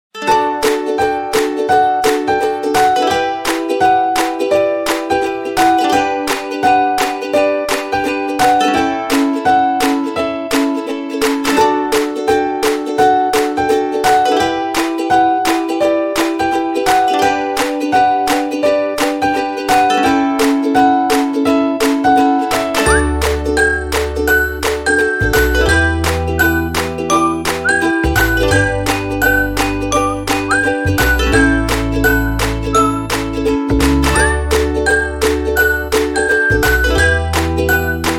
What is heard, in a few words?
background music, instrumental